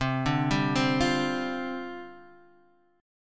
C Suspended 2nd Suspended 4th, down, Guitar, Drop D